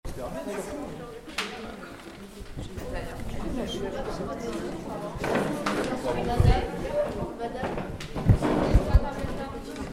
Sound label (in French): AMBIANCE PREPA A LA MAISON JOUET; college vallee du thore